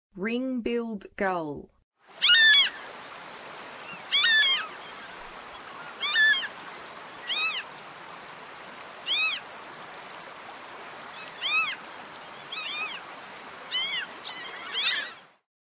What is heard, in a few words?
Ring-billed Gull; North America